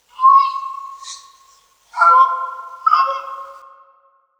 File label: Lone Goose; Sound Ratt; Natures Way; Natures Ringtones